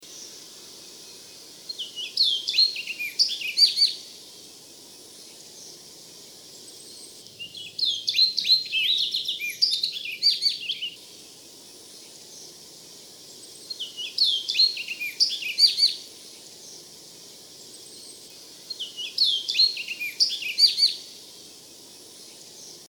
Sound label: Orchard Oriole